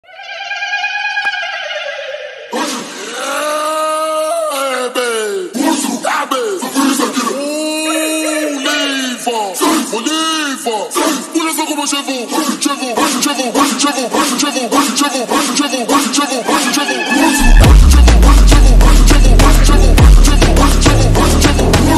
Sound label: slowed reverb